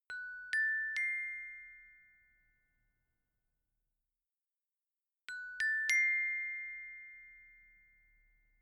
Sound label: notification glockenspiel triad up diminished